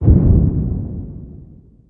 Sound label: implo, small explosion; freeuniverse